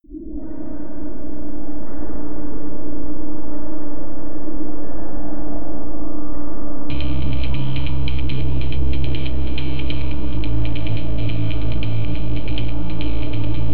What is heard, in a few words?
breakbeat